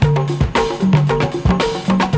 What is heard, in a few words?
ETHNO LOOP, BREAKBEATS